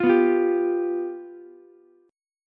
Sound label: F Suspended 2nd Flat 5th Ukulele, chord; strummed; Fsus2b5